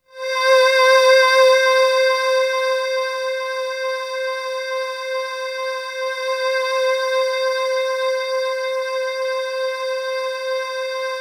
C5, Synth Line; 90 s Sample CDs; NiceBeats; SynthLine VOL-32

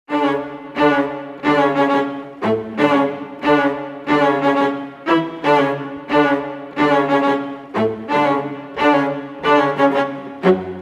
90 f#min violas cellos

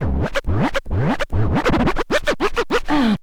scratch kit, vinyl

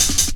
Fat Rolla Cut, Jungle Drum Loops; Zero-G Jungle Warfare 1, 2 & 3

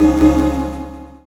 Chords Tones; Equipped Music; Loungin House

